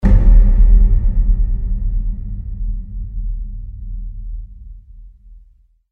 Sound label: short drums; crysis warhead